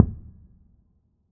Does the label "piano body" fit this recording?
Yes